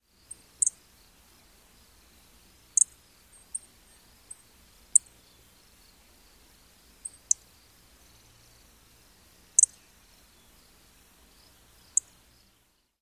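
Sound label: calls, Grasshopper Sparrow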